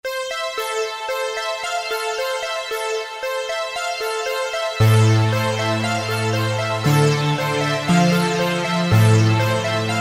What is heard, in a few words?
Sweep; Alesis Z5 Vintage Synthesizers And Classic Beatboxes; ArctcSweep